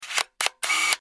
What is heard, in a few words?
camera